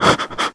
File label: scare; tf; slender; penumbra